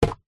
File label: nature water drip single on plastic hollow